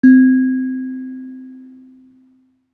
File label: kalimba C3 mf; Acoustic; circleskin